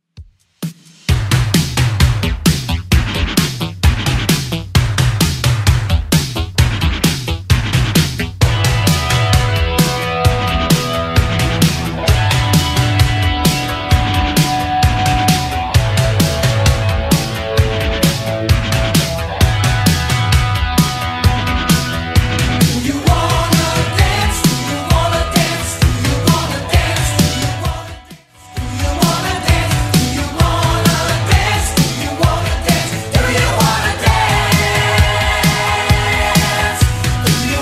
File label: 80s Redrum 131bpm